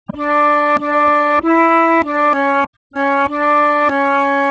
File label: separated flute